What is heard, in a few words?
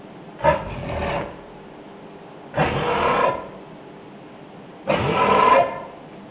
Casuarius casuarius